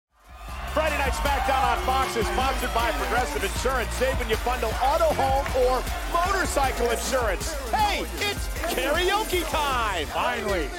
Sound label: karaoke; hey its karaoke time